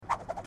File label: THROWING